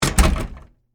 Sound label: Foley, Door, Close, Hotel, Room; Miscellaneous Doors; BlastWave